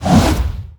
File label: 2H Epic Mace Underlay Attack